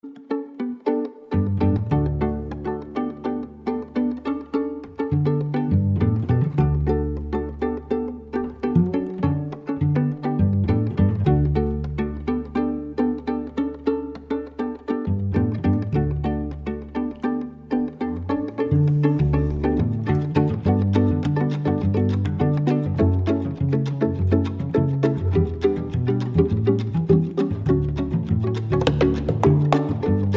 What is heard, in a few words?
Samba